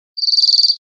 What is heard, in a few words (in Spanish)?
chorros cortos amillerados, verderon